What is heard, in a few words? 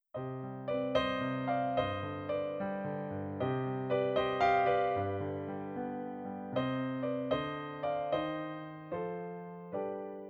piano